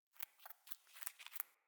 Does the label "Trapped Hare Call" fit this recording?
Yes